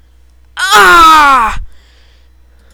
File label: groan